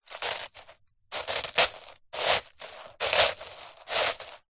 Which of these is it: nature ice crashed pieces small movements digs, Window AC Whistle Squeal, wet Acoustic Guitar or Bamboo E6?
nature ice crashed pieces small movements digs